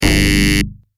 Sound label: buzzer